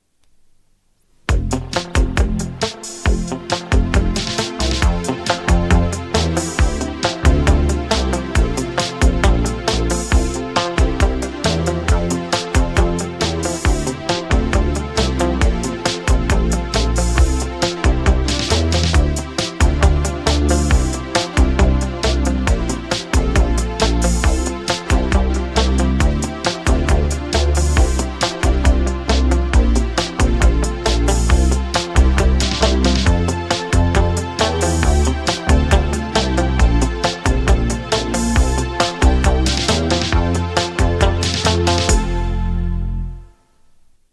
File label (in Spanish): Careta de entrada Radio Verano Instrum